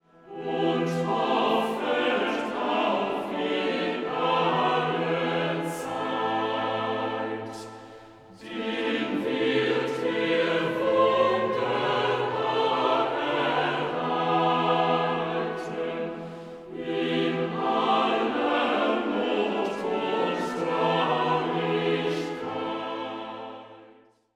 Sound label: bach choral; wer nun den lieben